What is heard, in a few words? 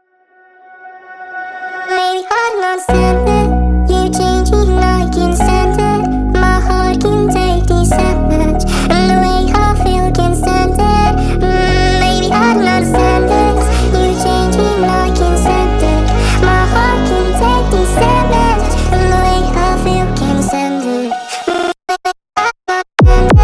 nightcore phonk version